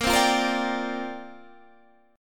C 7th Suspended 2nd Suspended 4th Bb

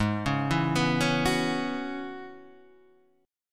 Gb 7th Suspended 2nd Suspended 4th Ab

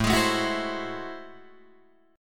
A Minor Major 13th, down, Guitar, DADGAD; AmM13; strummed

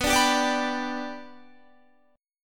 B Minor Sharp 5th, Guitar; strummed; JGuitar

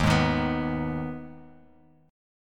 D sharp Suspended 4th Sharp 5th, Bass Standard; strummed; JGuitar

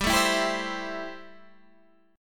A-Minor 7th G

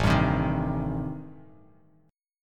A-Minor 7th Flat 5th, Bass Standard, chord; strummed; JGuitar

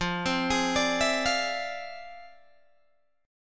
F Minor Major 13th, Guitar, DADGAD

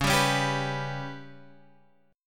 B Suspended 2nd Sharp 5th

